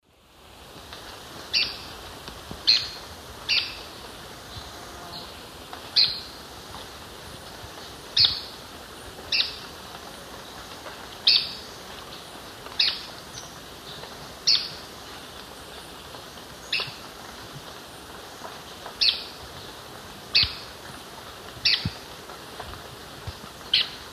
Hyloctistes subulatus, call; S. America; Eastern Lowlands

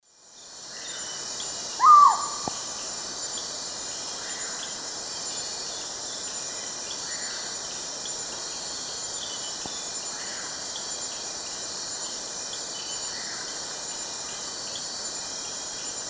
Pulsatrix perspicillata, calls of immature; S. America; Eastern Lowlands

